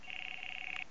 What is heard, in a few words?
nokia3510i low ringtone